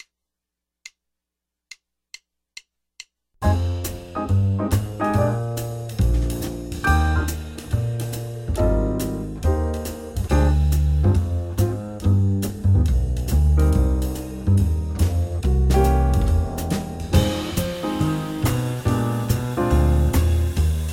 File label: (Cm) 8 Bars Loop